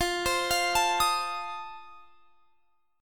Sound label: F 7th F down; arpeggiated